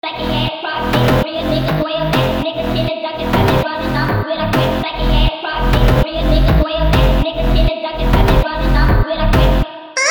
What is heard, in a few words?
Phonk Фонк